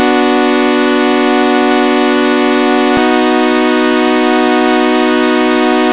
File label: saw 12 equal